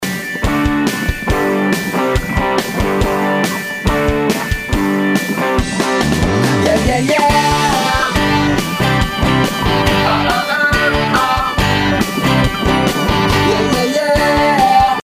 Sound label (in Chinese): 大气的背景音乐